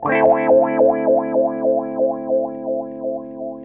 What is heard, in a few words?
WOWOWA, Funk Guitar; Zero G - Funk Guitar